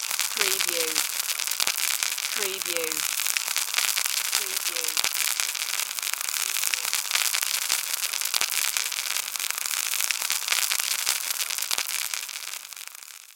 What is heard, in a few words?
Wick Fuse Burning